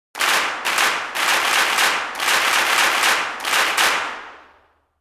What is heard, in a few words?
Unison Clap, Rhythmic unison sports clap.; Applause; Human